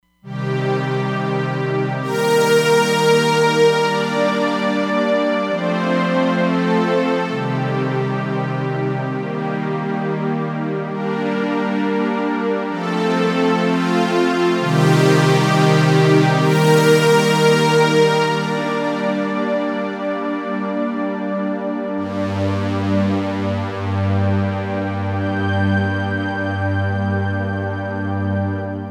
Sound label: Syn Strings, Roland Fantom XR